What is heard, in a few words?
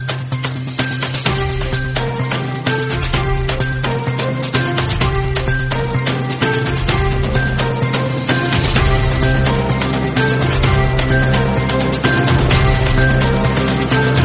breaking news intro bgm; aaj tak